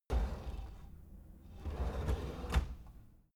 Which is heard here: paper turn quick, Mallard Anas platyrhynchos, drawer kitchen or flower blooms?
drawer kitchen